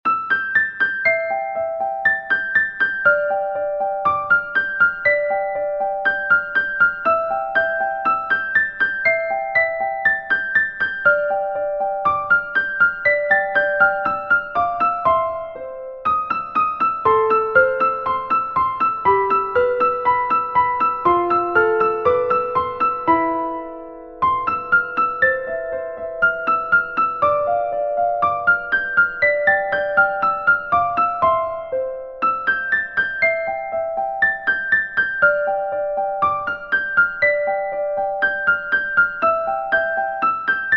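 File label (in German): music boxes gläserne Spieluhr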